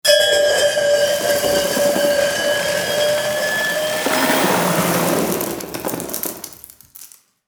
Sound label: long bell scrape with gravel